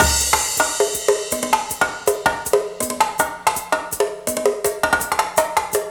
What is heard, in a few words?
Perc, Loops, breaks; m8 breaks